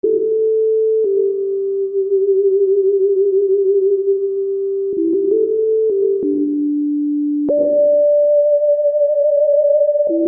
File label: Keyboard, Soul; Sonic Foundry (Sony Creative Software); Infinite Soul VOL-1